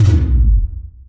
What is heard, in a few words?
bass drop